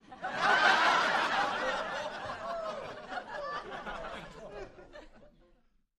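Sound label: Crowd 50 Single Hearty, small interior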